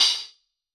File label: Soft Crystal, Hats; Airwindows